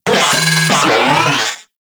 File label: Moa C Vocal Ability; Warframe